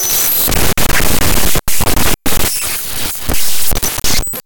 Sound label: Malfunction Glitch Medium; Bryce Raffle Sound